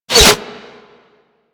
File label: Bullet Default Hit; Warframe